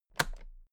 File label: toolbox latches close heavy; industrie wirtschaft; handwerk